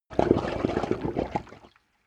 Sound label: volcano oneshot lava bubble